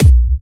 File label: Bassdrums Clubby Kicks; Vengeance Samples